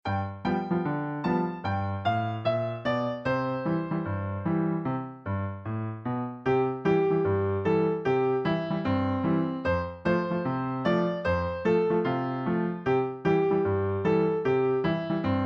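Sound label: five green and speckled frogs instrumental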